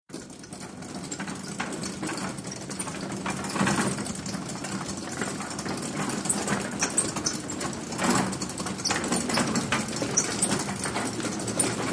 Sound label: Gurney Cart Stdy Run, gurneys